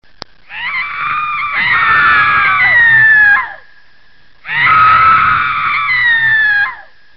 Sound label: scream